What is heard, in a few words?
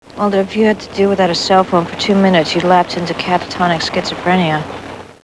Cell phone